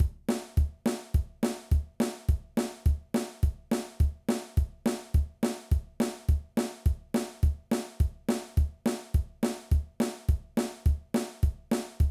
Groove 68 Drum Beats

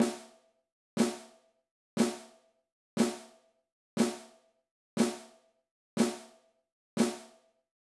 Snare Drum Flam Exercise

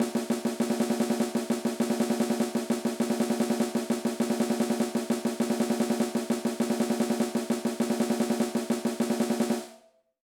Hand Exercise

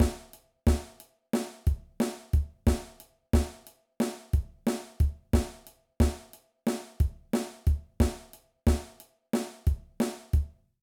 Basic Drum Beat

